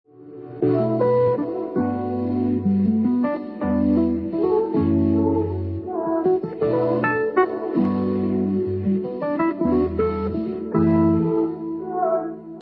Chill Distant Echoes Intro

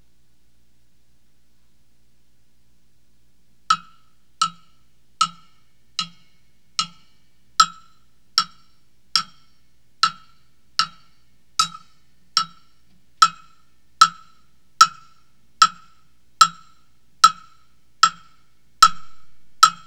cOWBELL

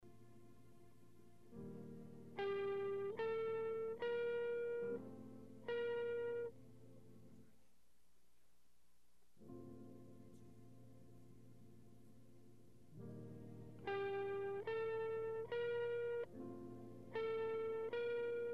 Guitar LINE

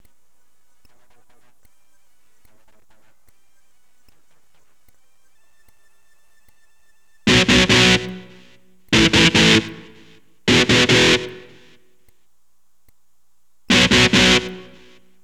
E GTR POWER CHORD

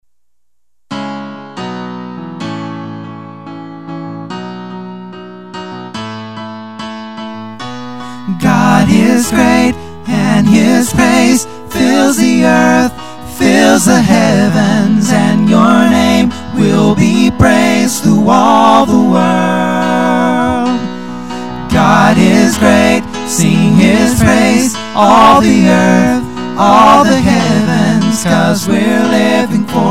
God is Great Chorus, 3 part vocal

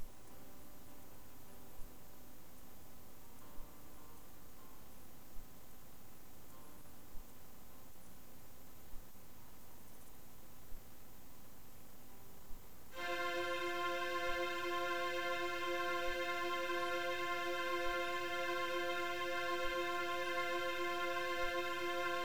STRINGS Recording

